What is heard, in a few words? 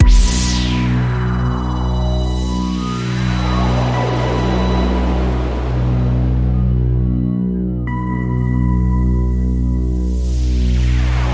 Radio Toolkit Pad